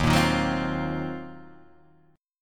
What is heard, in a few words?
Eb Minor 6th Add 9th, down Guitar Half Step Down